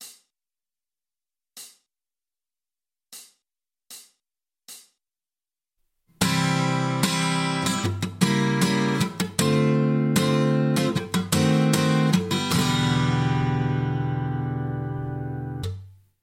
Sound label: dim, guitar